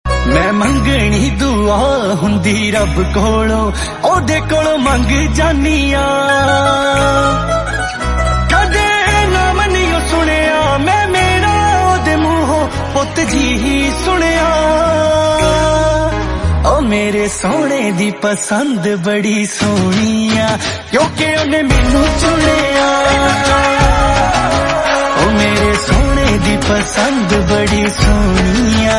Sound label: Punjabi Song Ringtone